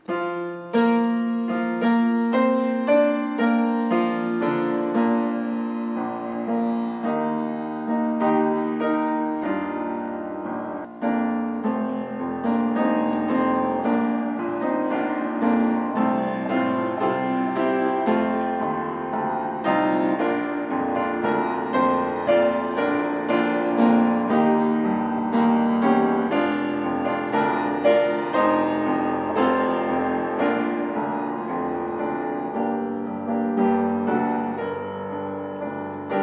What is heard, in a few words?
piano; hymn training